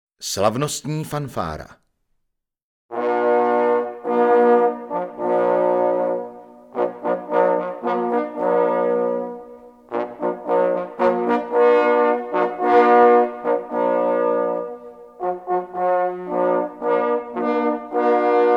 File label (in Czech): Slavnostni fanfara; desatero